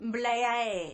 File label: blaeaeh